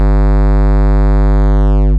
sine

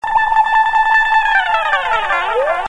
SLIDE DN, GUITARS

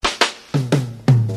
BRK FILL, beats

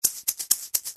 SHAKER

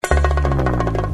bong intr

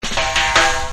BEATS

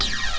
beat, drums

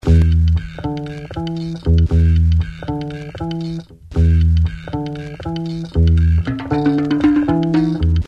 RIFF PERC

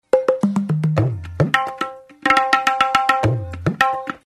TABLA

